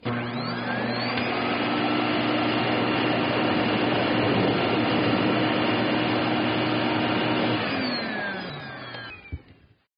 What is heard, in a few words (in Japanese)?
掃除機, 生活音; 生活音系効果音